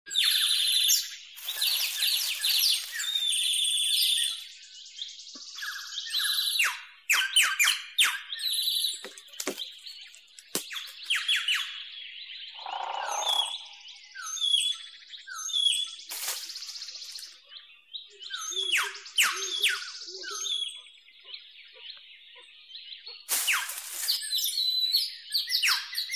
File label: Birds Frogs